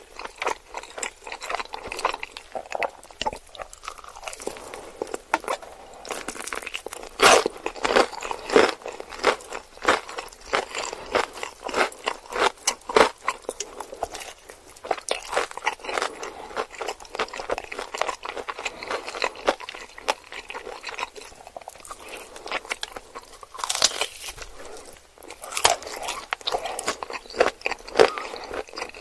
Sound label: ASMR; KFC